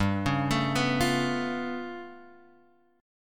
G 9th Flat 5th, Guitar, Drop D; 5,4,7,4,6,x; down

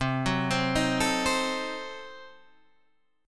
D sharp Diminished 7th C, Guitar

